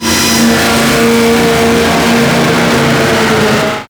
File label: Sentient Vessel Movement Limb; Warframe; Tau